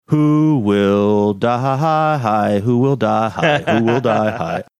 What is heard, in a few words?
singing who will die hi hi hi hi; game of thrones